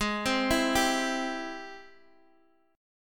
G sharp Augmented Major 7th G sharp Guitar Half Step Down; G#+M7